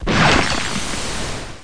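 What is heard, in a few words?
sizzle; Total Chaos Nukem 3d Pack; duke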